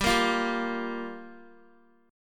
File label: G Minor 7th, Guitar; Gm7; strummed; x,10,8,10,7,x